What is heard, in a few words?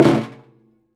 Prepared Drums